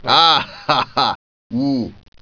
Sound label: Sound TNT